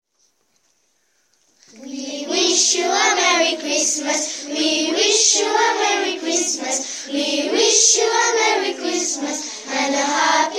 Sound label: Vocal